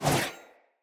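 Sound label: Sword Whoosh right to left; Weapons; Player; Swords; Fey Sword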